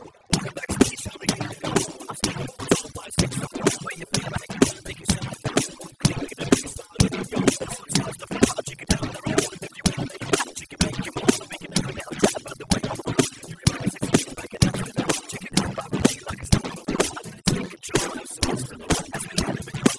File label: percussive